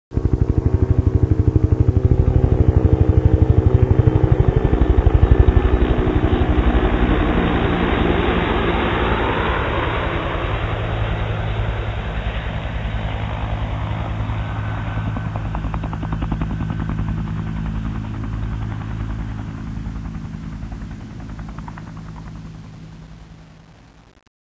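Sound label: helicopter pass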